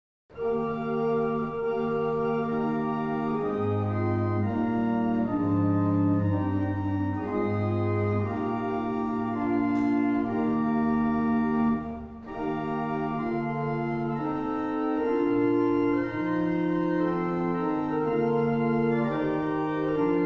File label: barrel organs; Shelland